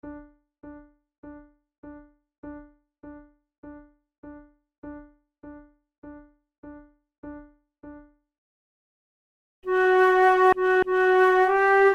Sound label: duet, flute